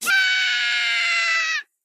red larva scream

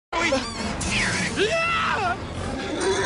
scream; lando

